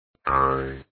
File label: POING; mon the monkey